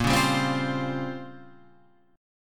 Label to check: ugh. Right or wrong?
Wrong